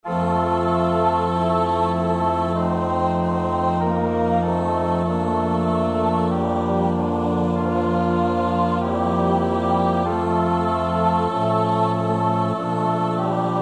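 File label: soprano alto tenor bass; Brewer (A.Herbert); Magnificat and Nunc Dimittis in F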